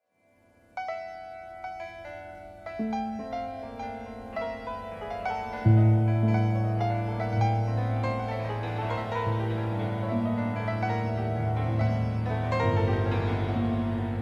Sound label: piano thread noise all